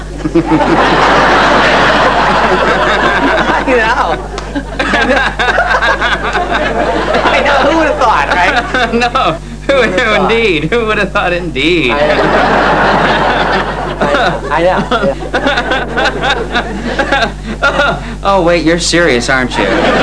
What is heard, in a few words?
laugh; Oh wait, you're serious